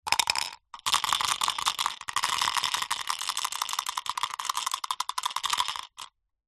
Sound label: dice drop into plastic shaker and shake